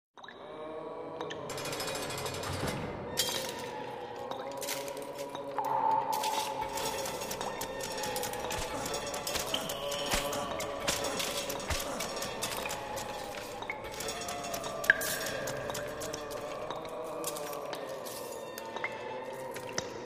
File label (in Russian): podzemnaya tyurma skrip metalla vorot kapaet voda stoni